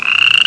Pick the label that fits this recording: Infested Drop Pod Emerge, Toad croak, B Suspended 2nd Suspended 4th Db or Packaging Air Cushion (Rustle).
Toad croak